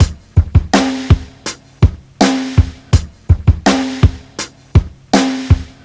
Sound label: 82 bpm breakbeat D# key, Free breakbeat sample - kick tuned to the D# note. Loudest frequency: 851Hz